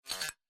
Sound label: computer error sound